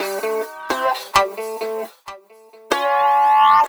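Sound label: guitar, Roots Funk; USB Soundscan vol.41; AKAI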